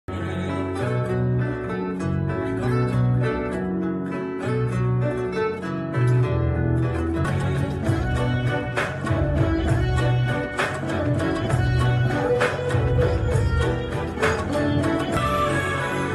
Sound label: love bgm; ooru peru bhairavakona; sandeep kishan; varsha